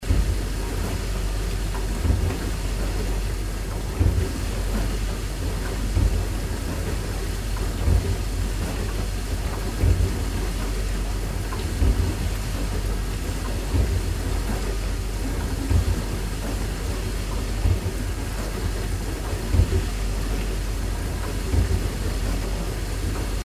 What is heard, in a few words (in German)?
dish, noise